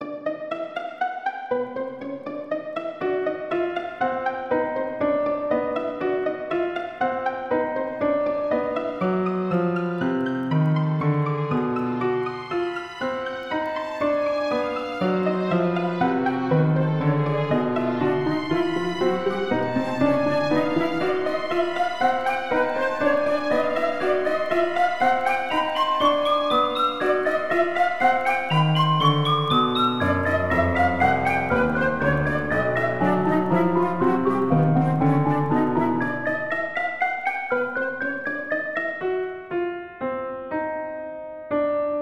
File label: Trippy Atmosphere